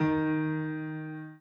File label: Piano Chord